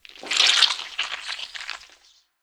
Skel Dog Bite Gore

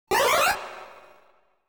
TRAP

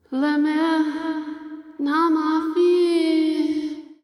Death; Hollow Knight; Grey Mourner Death Version

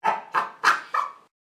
Monkey; Rabbids Multiverse

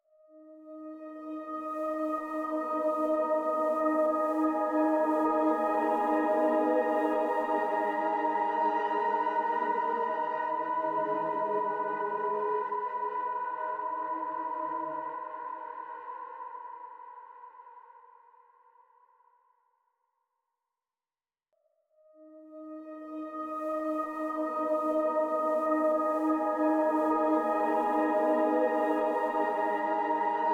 Camera Stinger

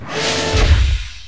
Fsdoor

